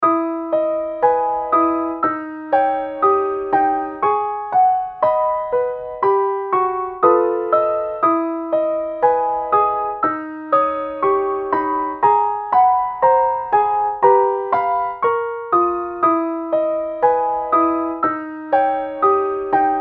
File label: piano